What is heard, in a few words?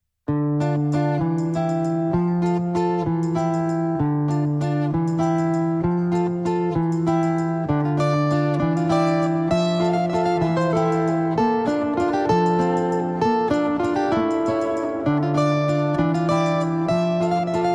csguitar89; lozoot